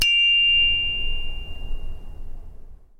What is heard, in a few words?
chinese bronze bell side; sackler